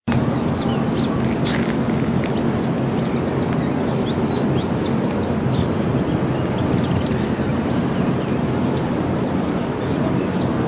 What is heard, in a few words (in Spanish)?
voz jilguero dorado